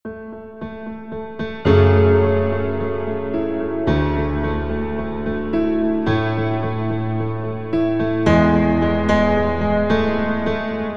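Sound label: piano with reverb; Sweetwater Creating Great Sounds; creating great sounds in your daw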